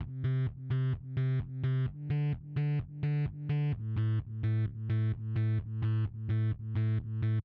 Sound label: Distorted Basement Bass